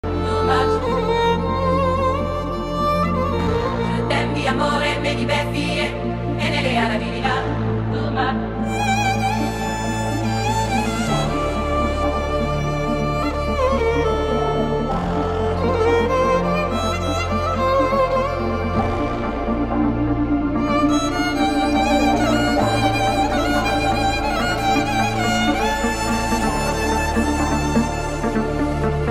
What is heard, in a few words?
violin remix